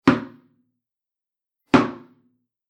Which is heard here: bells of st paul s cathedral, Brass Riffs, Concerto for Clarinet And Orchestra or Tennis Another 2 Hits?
Tennis Another 2 Hits